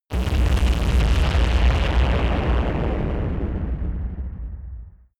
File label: massive blast strike